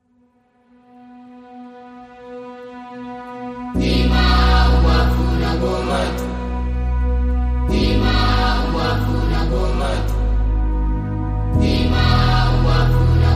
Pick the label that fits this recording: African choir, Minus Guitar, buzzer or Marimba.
African choir